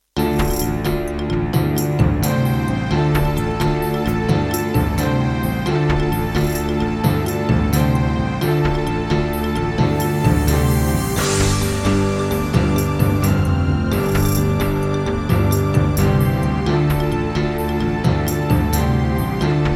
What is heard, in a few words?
Korg X5DR, wavetable; Heretic